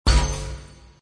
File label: open; effects